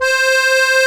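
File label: accordion C 3; Best Service; Ethnic Journey Sound Cube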